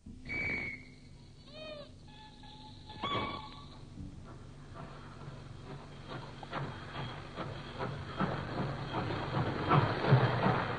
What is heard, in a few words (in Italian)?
campionatore